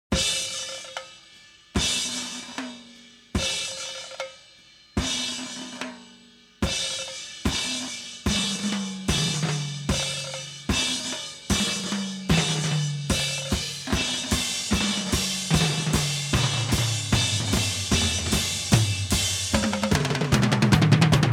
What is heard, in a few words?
tension build